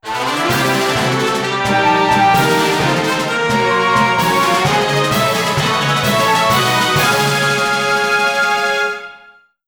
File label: Fanfare; Final Fantasy V arranged